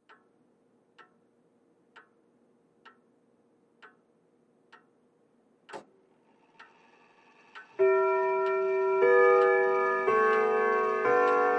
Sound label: Grandfather Clock Ti